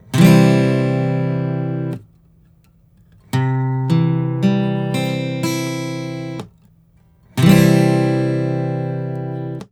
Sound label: Major or Minor